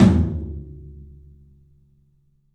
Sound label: 3-D Percussives, LOW Toms; E-MU Producer Series Vol. 5 – 3-D Audio Collection